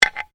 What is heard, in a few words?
Bones Human Move